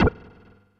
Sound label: Swallow; Kirby; Super Smash Bros